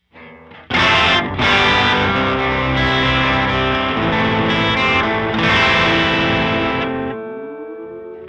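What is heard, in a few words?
Envelop filter attack 65ms release 500; H910; sensitivity 20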